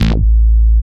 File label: DR. LOOP, BASS; X-Static Goldmine 3